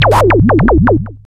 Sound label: FX, attacks